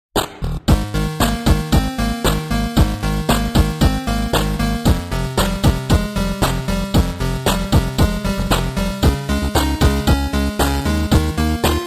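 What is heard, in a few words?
8-bit music for mobile phone ringtones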